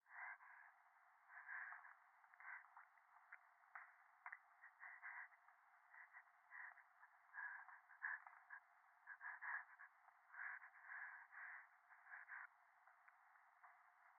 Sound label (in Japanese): 電話 右耳快感吐息 山奥